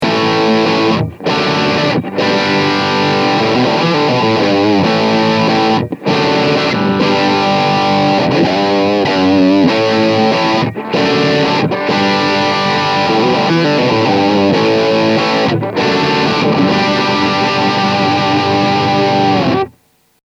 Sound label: SM57 off axis; Bogner; Captor X